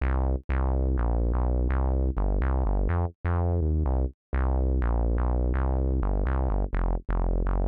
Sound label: 125 res synth bass E; PowerFX - Nu Jazz House